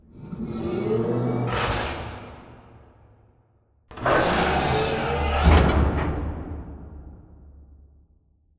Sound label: Upper Arm Retract Fold; Boomtown; Sideways Town; Titanfall 2